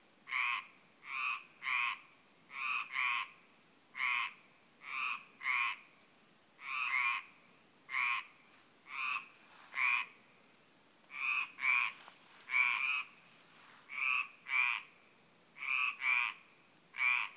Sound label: croak